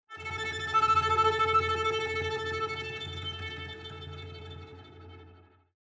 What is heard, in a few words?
Cello Shivering Tremolo; SoundsCrate